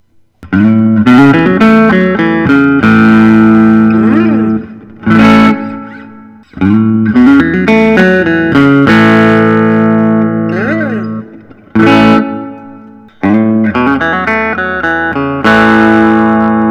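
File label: gibson custom les paul special double cutaway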